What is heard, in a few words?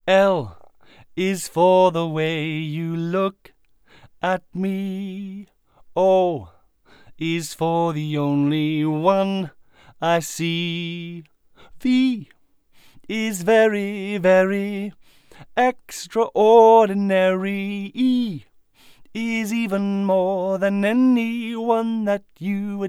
Off Axis Coloration 135deg Omni; Spots